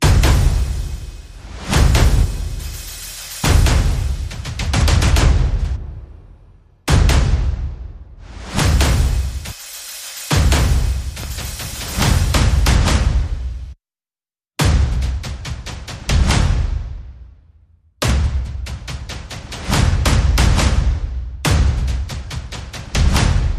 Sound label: percussion only